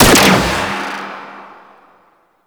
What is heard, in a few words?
Shotgun Mech; CorpusShotgun